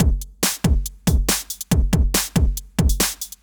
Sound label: Loops BB 140, BreakBeats; Vengeance Samples